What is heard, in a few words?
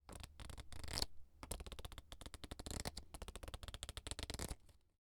Playing Cards Being Shuffled